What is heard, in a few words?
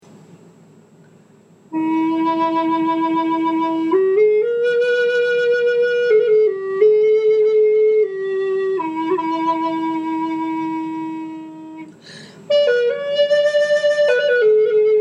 E Pear Shell, flutes